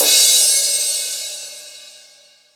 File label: big urban crash single shot B key; cymbal drum samples